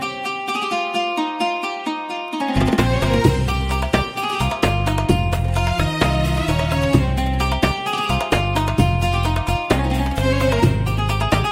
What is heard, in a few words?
ringtone